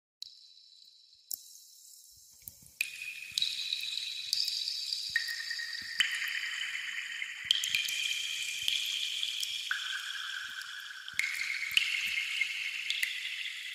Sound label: 3d water drop sound water sound water drop iphone ringtone sms ringtone